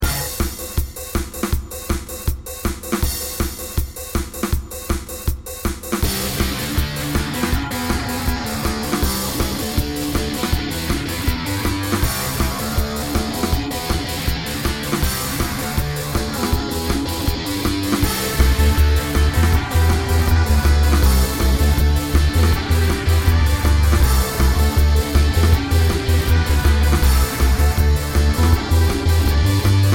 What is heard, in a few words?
rock BPM160